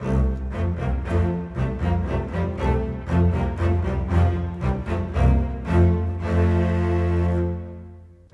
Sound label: Bass Cello, Orchestral; Sonic Foundry (Sony Creative Software); Orchestral VOL-2; Modern 31